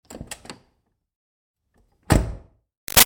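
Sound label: Truck Ford Pickup Door Open Close; Trucks; Vehicles; BigRoomSound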